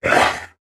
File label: bite, fish